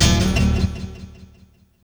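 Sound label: guitar, Hip Hop R n B; USB Soundscan vol.19; AKAI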